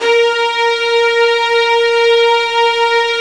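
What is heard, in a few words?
violin section, Arco Violins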